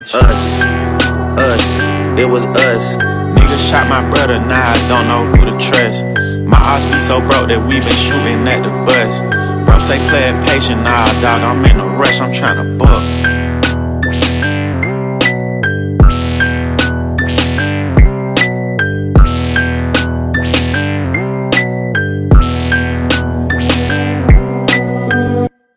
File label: Ringtone